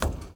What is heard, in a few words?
roof step; Golden Crayon Sounds